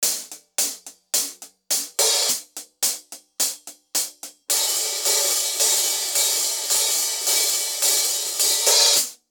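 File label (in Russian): барабанная мелодия с тарелками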